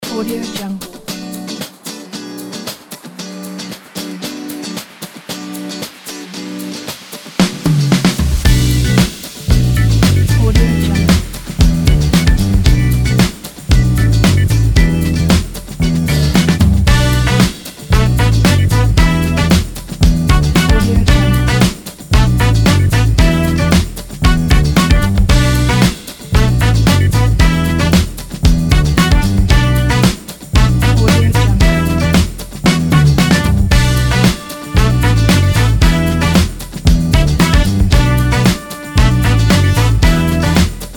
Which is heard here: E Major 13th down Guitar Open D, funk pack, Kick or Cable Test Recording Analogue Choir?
funk pack